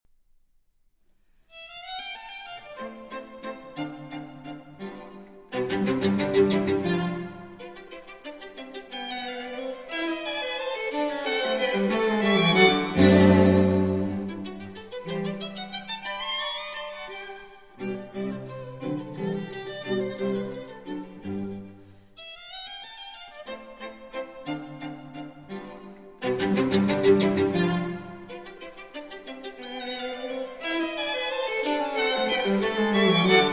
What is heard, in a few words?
String Quartet In C Allegretto; String Quartet No.19 In C, K.465 - Dissonance; 3. Allegretto